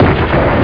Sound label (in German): SCHUSS